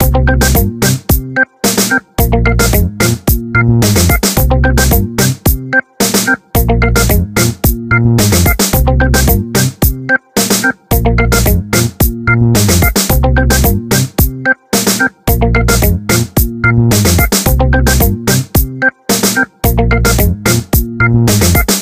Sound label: Organ Dub, ringtones; LG GW620